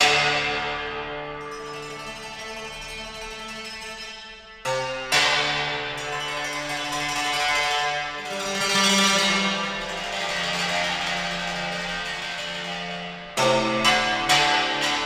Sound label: Gameplay Ui; Prince of Persia The Lost Crown